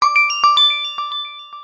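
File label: Ring a Ding notification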